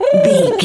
pigeon call angry

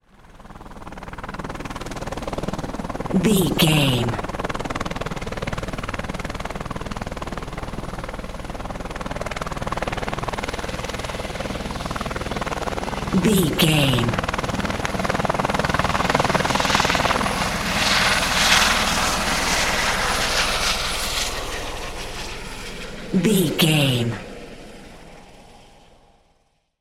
helicopter chopper idle takeoff pass

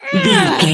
voice female attack

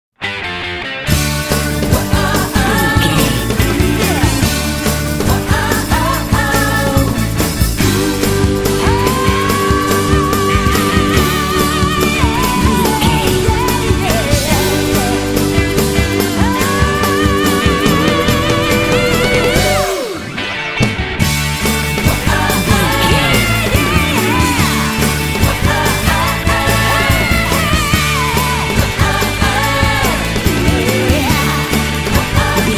super fun pop

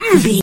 voice male attack

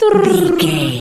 voice fun character vocal crazy

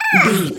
goblin fairy hurt pain

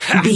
voice male attack

